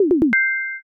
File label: potion